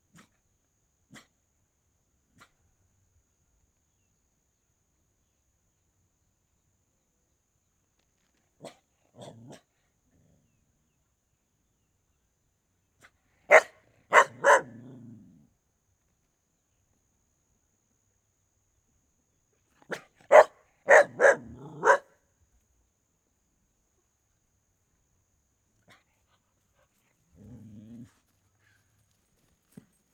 Cachorro latindo bufando e rosna baixo no final; animais

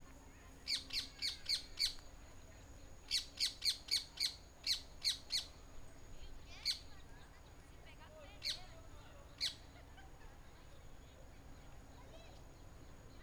Quero quero parque das garcas a tarde com pessoas longe; aves; Coleção Sonora do Cerrado

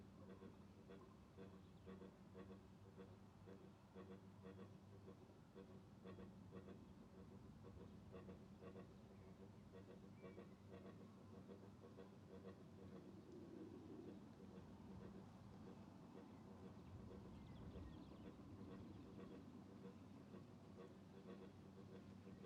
Transformador Eletrico com Ruido Estranho em Estrada de Chao; Estrada de Chao de Alto Paraiso

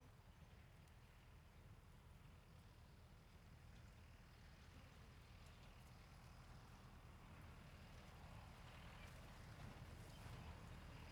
Caminhao passando pela direita com mosca; maquinas

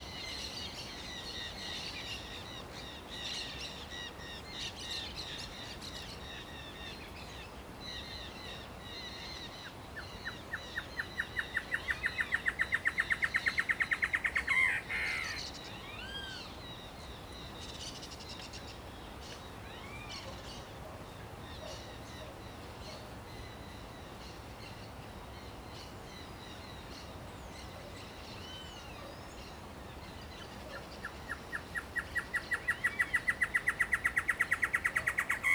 Passaro canta perto com outros passaros e cachorro longe em ambiente rural; aves

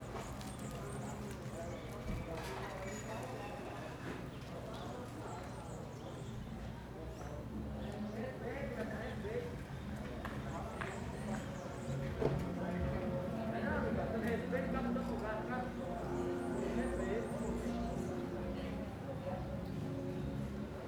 Ambiencia em frente a mercearia com pessoas, carro com musica, pouco trans; pouco transito

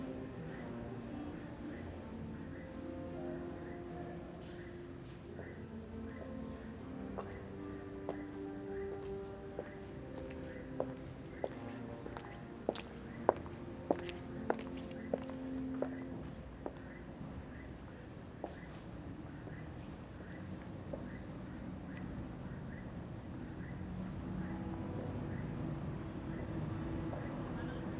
Ambiencia em frente a igreja a noite com musica pessoas e sinos tocando

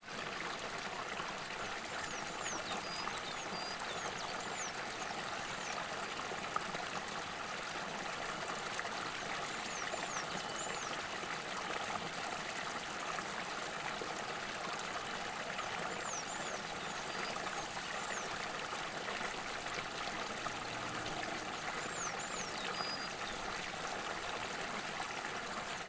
Queda de agua no rio com passarinho; Cerrado

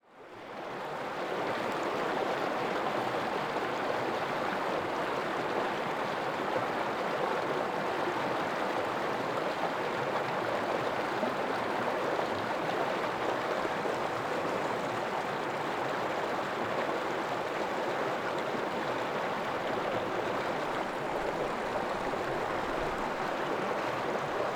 Queda de agua dentro de pedra Cachoeira pequena som abafado

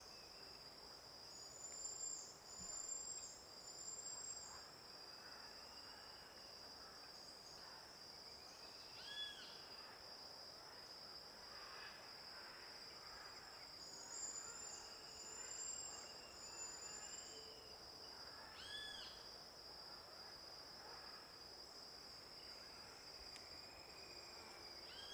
Ambiente com passaros e assobio agudo; aves

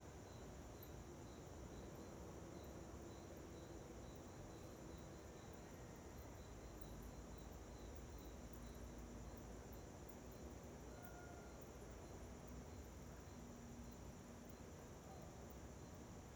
Ambiencia quintal de cidade pequena a noite com morcego, ar condicionado; e grilo; ambiencia domestica

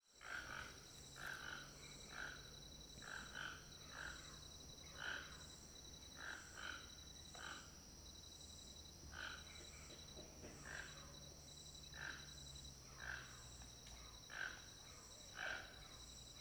Ambiencia de final de tarde quintal casa Alto Paraiso com Tucanos bem perto

